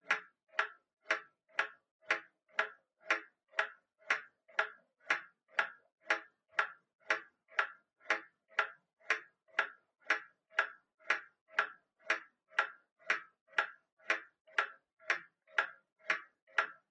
Pendulum Clock Hardware Tick

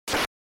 NES Fighting Swing

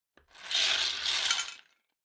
Drawing Machine Garapon Spin Drop

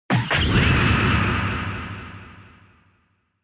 Retro Anime Robot Low

